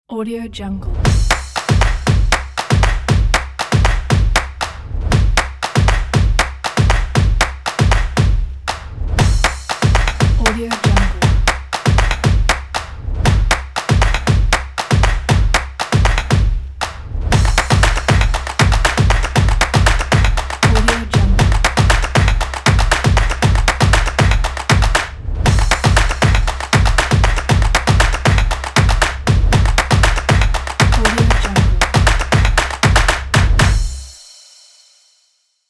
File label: drums in